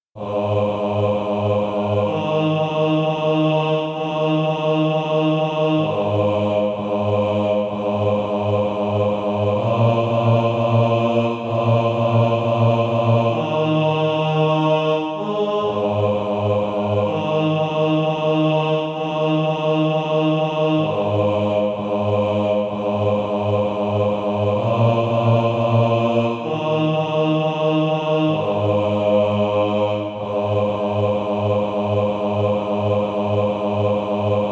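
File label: bass, Practise Music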